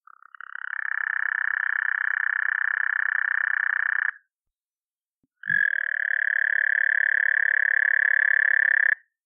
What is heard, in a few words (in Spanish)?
Sapo costero